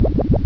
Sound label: acid pool move; Spectre Supreme Demo